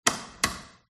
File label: door lock; fox audio